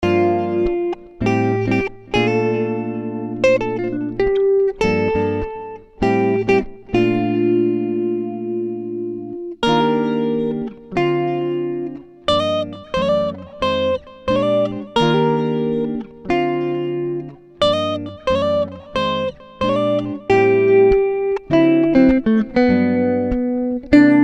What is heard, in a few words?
Clean Electric Guitars